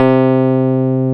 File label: PIA, E-PIANOS MKS-20; Sound & Vision; Gigapack I CD 2